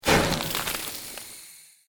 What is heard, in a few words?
Created Magic Spell Ice Bolt